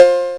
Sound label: BELL; USB Soundscan vol.27; Ragga & Dub Reggae; AKAI; BELLS KIT